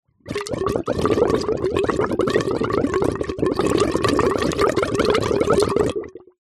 water gurgle bubble could be blocked drain; nature

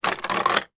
toy garage plastic elevator down; sound effects one

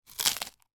human person bites into rice cake

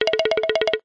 cartoon run or shake fast rhythmic wooden; zapsplat

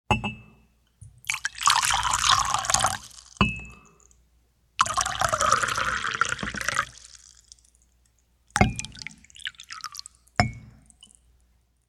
food drink tea pouring with clinks; sound effects one

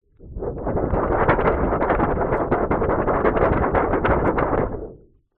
foley paper laminated large sheet shake wobble

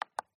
Printer Button Switch Press; MKH8060

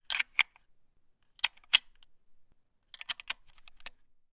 weapon gun savage 10 .300 blackout; still north media

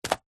magazine cheap set down carpet; household; zapsplat

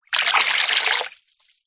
household water pour into metal bowl from cup

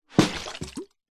bottle flip plastic water bottle throw flip land; zapsplat

